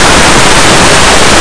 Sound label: book